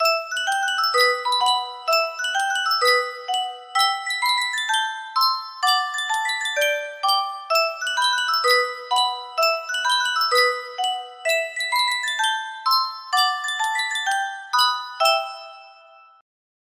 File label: Yunsheng custom tune music box, music box melody